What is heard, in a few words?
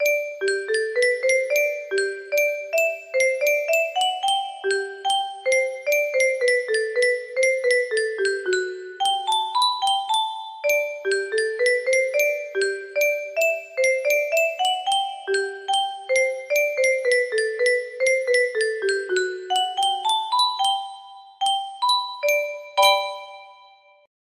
minuet, music box; midi